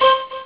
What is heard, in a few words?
Breath